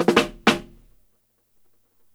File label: 125 fill dry, drum loops, dub; 125 BPM; Drumdrops In Dub VOL-1